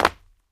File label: concrete land; Golden Crayon Sounds